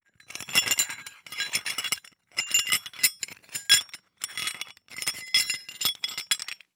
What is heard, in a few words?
Hole Saw Rattle, Hole Saw Bits